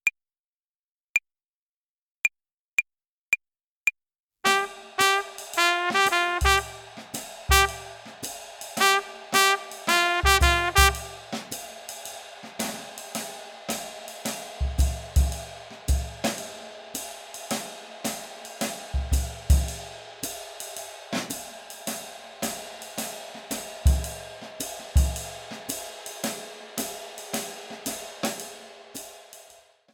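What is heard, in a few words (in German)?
Swing Phrasierung Übung; play along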